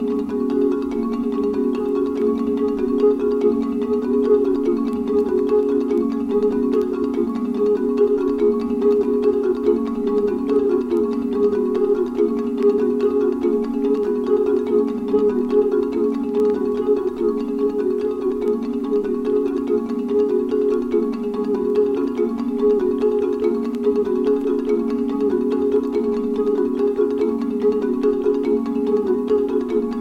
Drumming (part II); Deutsche Grammophon

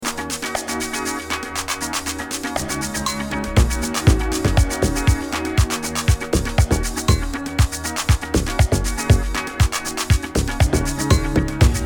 Instrumental

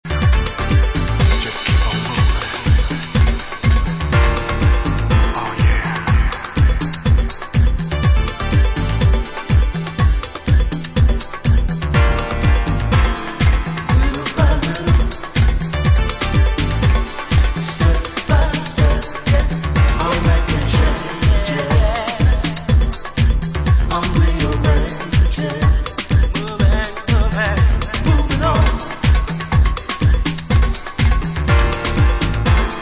vocal

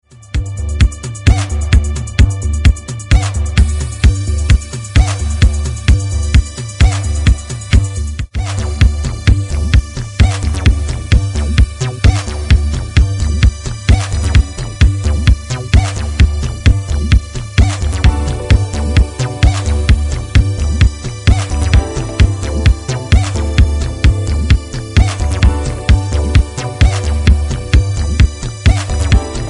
Boing Pop

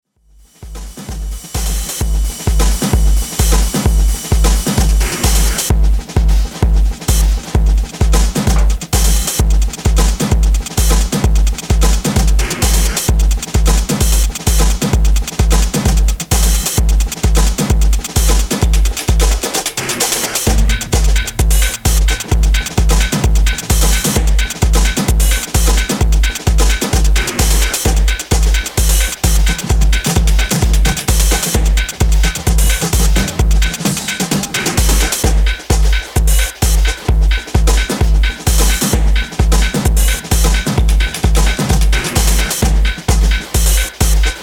Cymbal Shudder

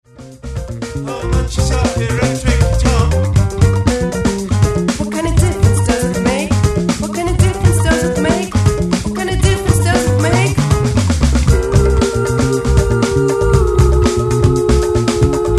erectric guitar

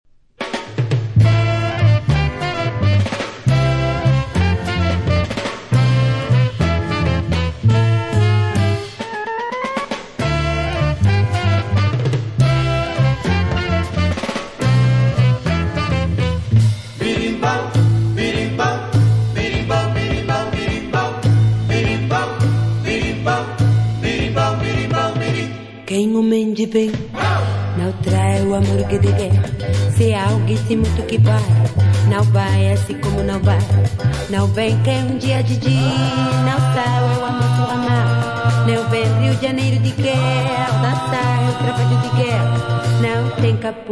bossa 70 - berimbau

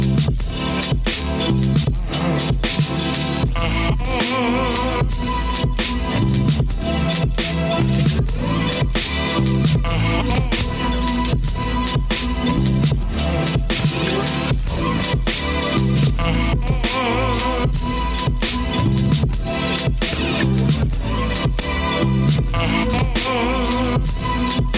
Riff

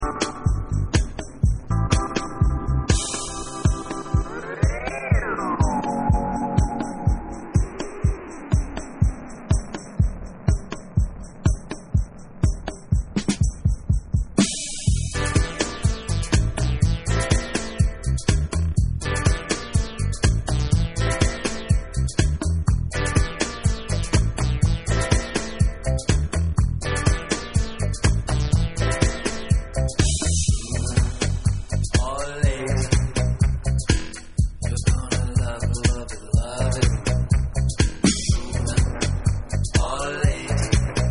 dub; Bear Funk

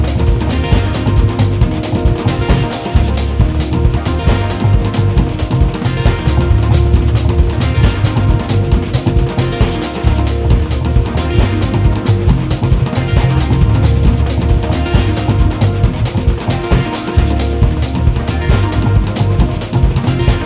drum dance

